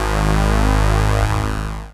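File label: synthesizer Dance; Best Service Gigapack 1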